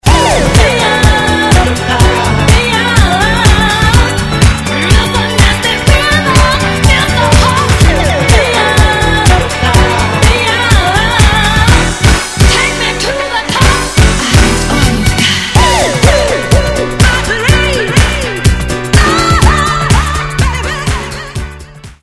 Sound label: disco world 124bpm